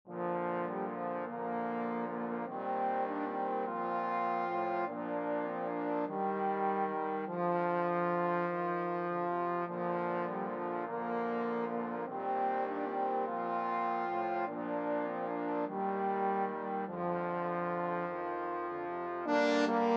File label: skye boat, trombone quartet